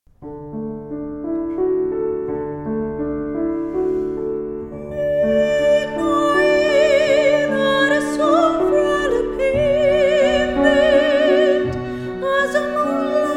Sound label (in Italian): soprano